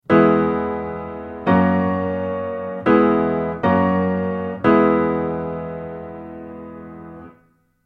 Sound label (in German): akkordfolgen